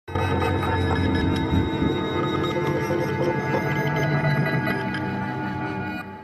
Different Ringtones

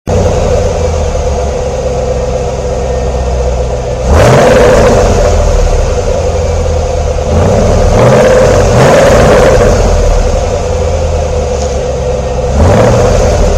Cars Effect

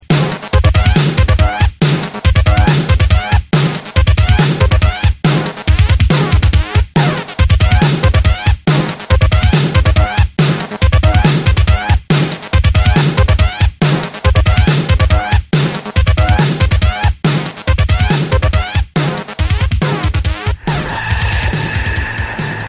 Fast Beats Drums

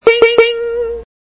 aaaa

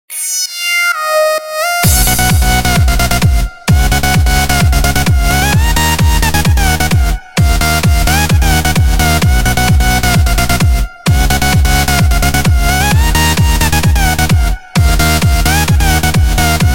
Club Party Mix

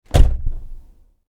The Sound Of A Car Door

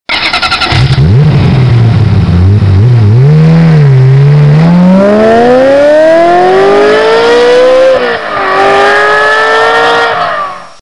Honda Sports Car

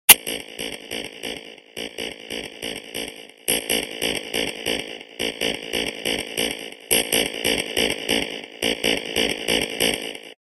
Metallic Sound

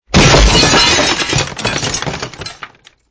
Explosion Short Glass Debris

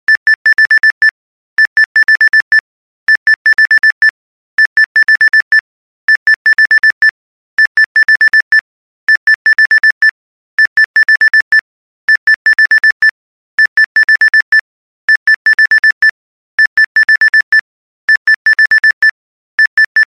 Hard Beep Alarm

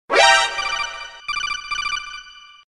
Exclamation Sound

